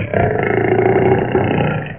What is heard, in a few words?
Growl; Realmz 4.0